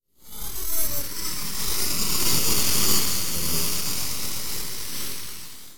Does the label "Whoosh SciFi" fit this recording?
Yes